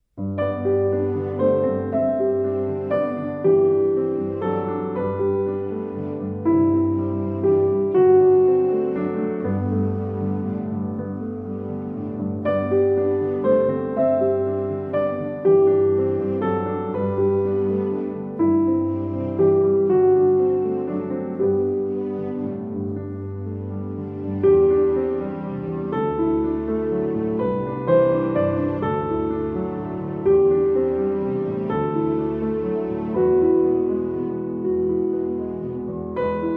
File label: With Strings